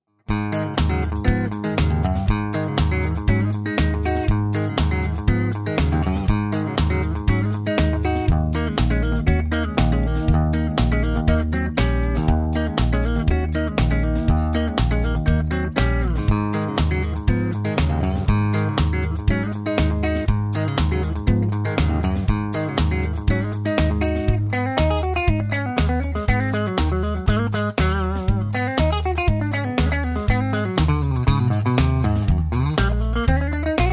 clean bridge neck, Tele; schwarzbach; VP-Tele-Pre55

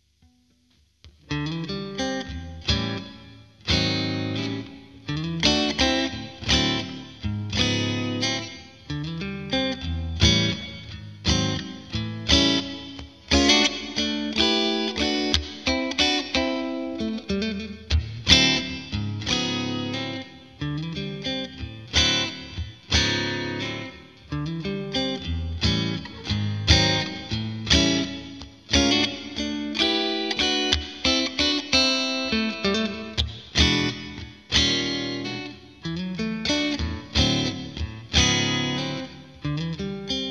Mitte Twin Clean; strat56; Middle 1

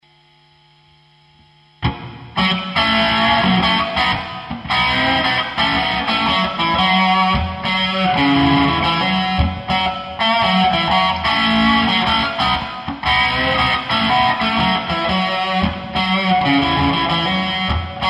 dist, strat vintage; Bridge 2

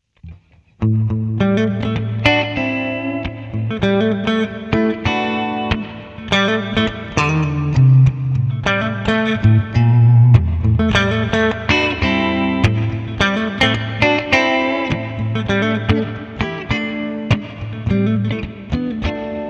clean, humbucker; Neck/Bridge 1

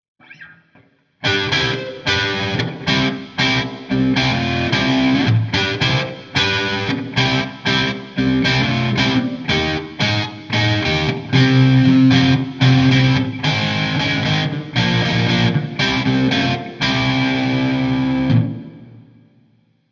mitte, telecaster; MudCat; Neck/Bridge 2

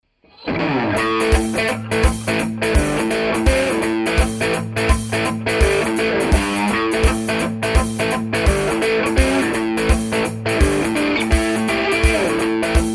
highgain bridge neck; schwarzbach; HB59-CreamGen

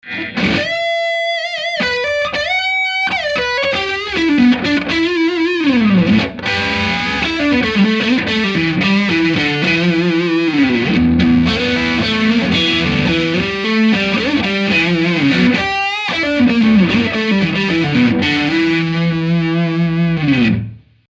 brett neck middle; schwarzbach

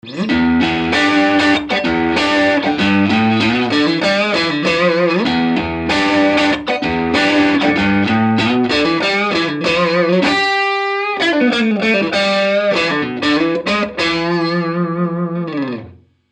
crunch middle, Strat; schwarzbach; VP-Strat-56

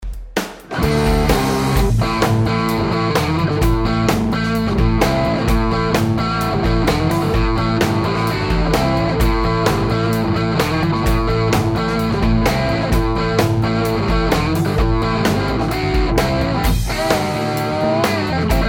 higain bridge neck, Tele; schwarzbach; VP-Tele-Pre55

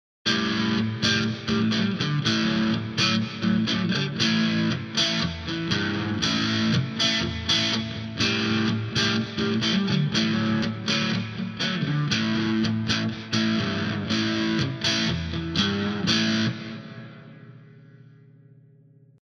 dist mid, strat; Middle 1

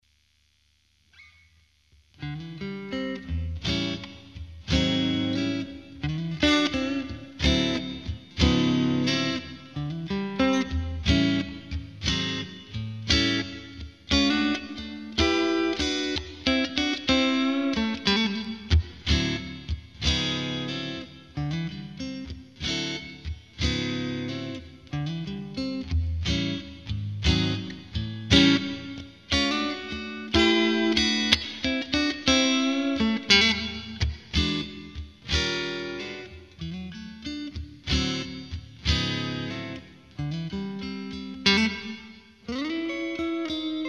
Neck Twin Clean, strat66

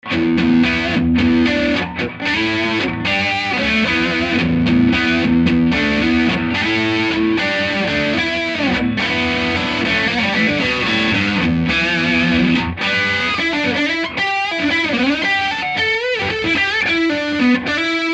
overwound brett middle; schwarzbach

